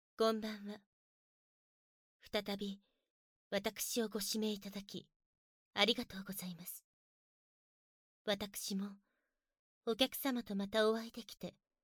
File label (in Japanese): あまあま耳舐めおちんぽ手コキ, ASMR; SE無し